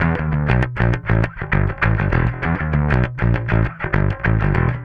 Bass

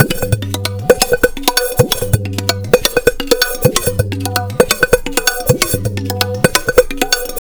Tabla, 130 BPM; Zero-G; Pure Tabla

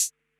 mid hat; Polyend; Legowelt

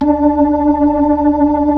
B-3

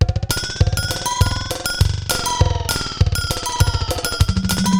Digital Delay RX17 (100BPM), cyborg beats; musicradar

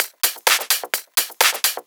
Clubhouse Loop 128 BPM

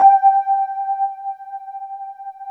WET RD G4 L, Keyboards Old School; USB Soundscan vol.09; AKAI; WET ROAD

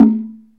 TONGUE BOX, ETHNIC DRUMS; Zero-G Ambient 1

